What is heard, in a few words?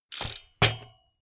bmx bike pedal grip freewheel